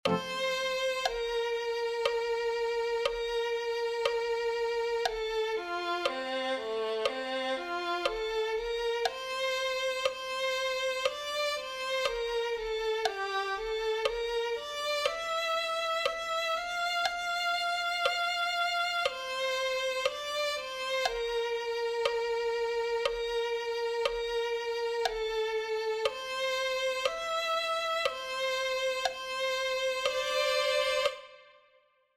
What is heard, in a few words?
violin